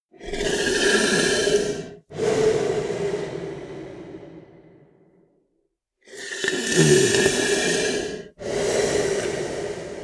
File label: monster snore breath; koerpergeraeusche; comedy-cartoon; soundpool